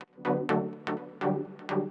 synth house 125 A; eJay; Keys

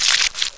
squelch

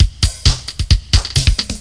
LOOP; Descent

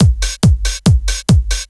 Drum B, Techno Drums; TechnoMusicMaker

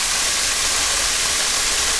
water jet; Mr. Wonder's Greatest Toyshop on Earth; OmniMedia; theshop

